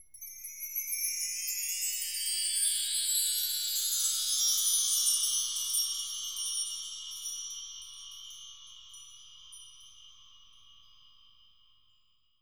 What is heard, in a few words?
Btree, BELLS; Roland MV8800